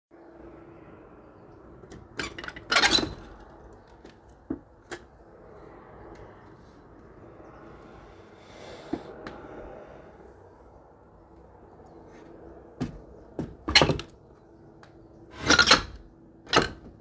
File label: Opening window